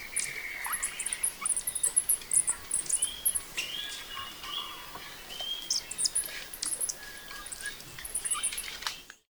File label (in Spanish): Colibri Jacobino Nuquiblanco